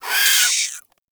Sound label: Forest Rodent Vocal Death; Vocals; Warframe; Characters